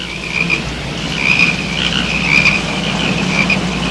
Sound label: frogs; quake2